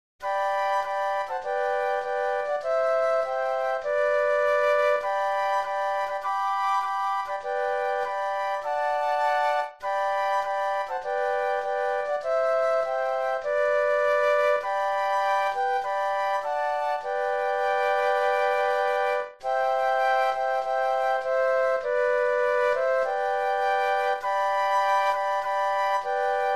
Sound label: Flute Trio